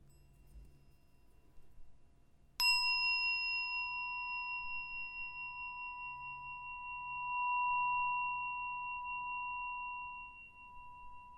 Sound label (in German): Klangschale